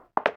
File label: Unlock; ui